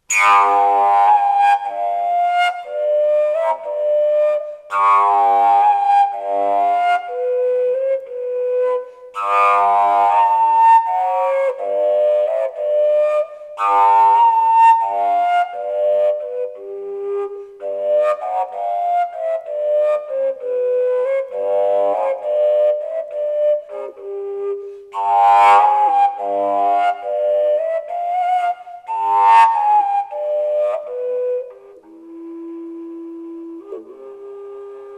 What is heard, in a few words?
g plain, fujara; folkart slovakia